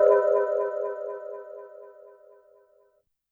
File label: Sonar L, YAMAHA RM1X